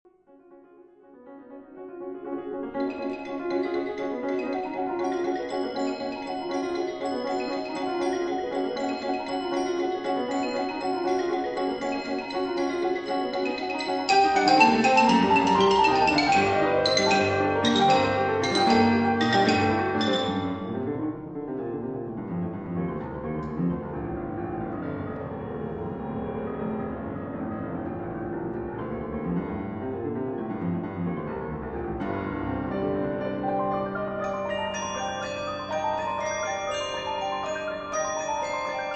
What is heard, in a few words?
Quartet